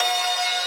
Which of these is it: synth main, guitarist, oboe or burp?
synth main